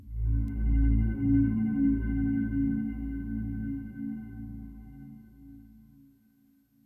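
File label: synth electro 140 A; Techno; Keys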